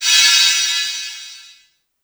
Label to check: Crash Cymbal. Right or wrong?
Right